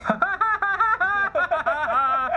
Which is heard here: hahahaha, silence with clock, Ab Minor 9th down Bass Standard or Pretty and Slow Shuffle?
hahahaha